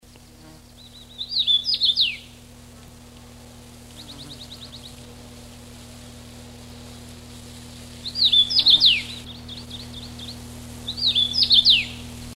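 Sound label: Carpodacus erythrinus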